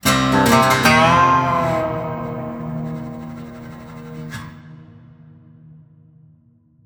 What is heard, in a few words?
medium Win Riff; netent